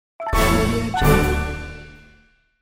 big game win pack

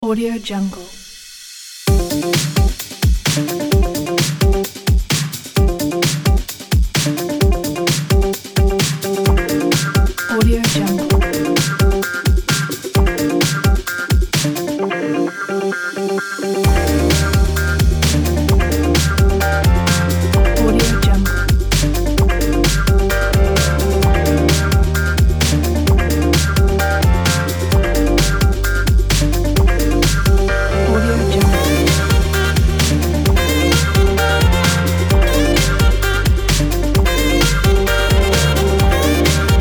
inspired happy chill funk

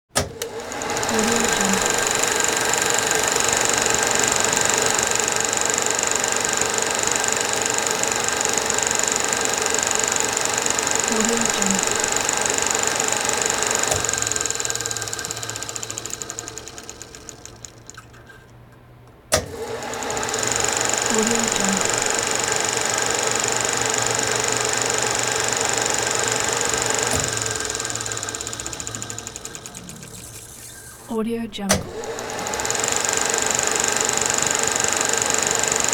16mm movie projector running

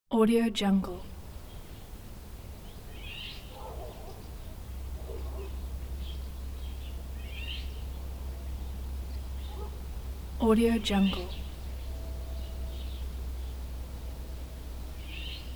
quiet village ambience with oriole bird

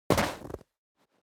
foley step snow jump

